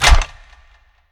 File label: shield tap; smite; Ares